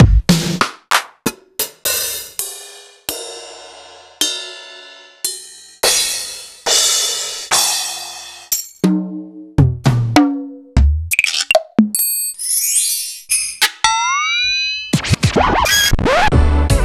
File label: Kit; proteusvx